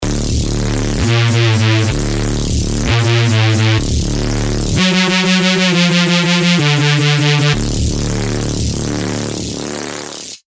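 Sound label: bass, Turbulence, Serum reese; Revealed Serum Ultimate Reese Vol. 3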